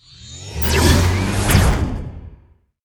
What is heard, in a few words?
boss tower